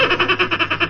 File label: dolphin chatter